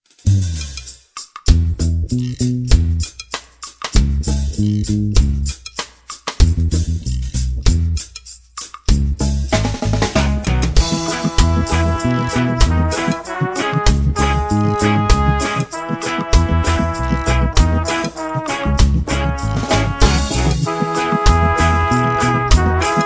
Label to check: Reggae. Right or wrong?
Right